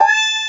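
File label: Cry Baby Lick; Mo' Phatt; Digital Sound Factory Mo' Phatt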